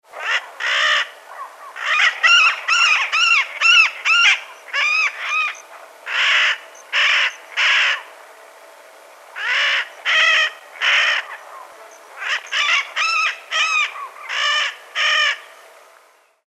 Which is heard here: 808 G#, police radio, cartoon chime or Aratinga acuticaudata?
Aratinga acuticaudata